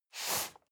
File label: cardboard box medium slide short; objekte